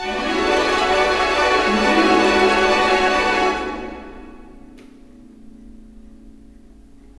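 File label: 128, orchestra; East West; Scoring Tools; Fantasma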